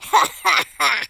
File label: laugh, speech, War General